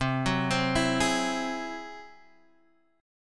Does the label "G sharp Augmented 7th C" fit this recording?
Yes